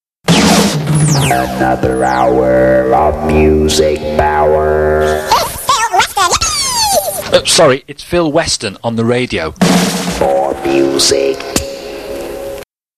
Jingles

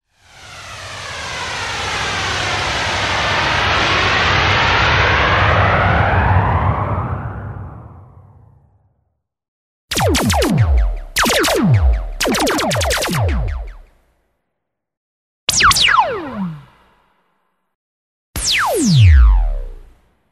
Laser sounds; JINGLES

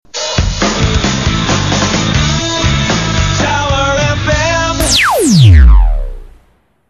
Tower FM Jingle; Tower FM Jingles; Laser

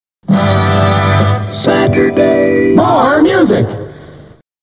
Jingles

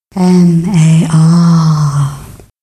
jingles

